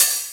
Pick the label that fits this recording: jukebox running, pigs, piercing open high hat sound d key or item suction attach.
piercing open high hat sound d key